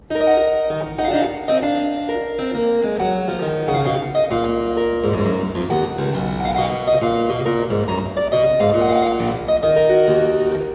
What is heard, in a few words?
Suite in E Minor - Rondo, spoken, Keyboard Music; William Croft